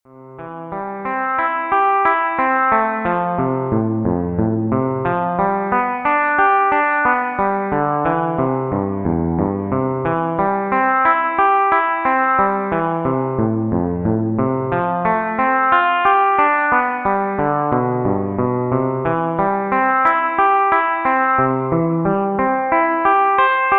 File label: CAGED Arpeggio C 90bpm